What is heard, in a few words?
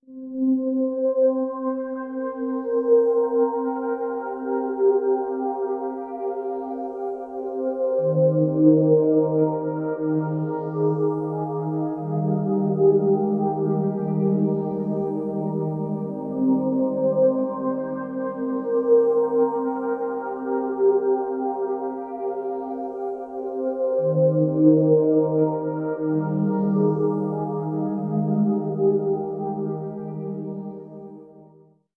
synth pad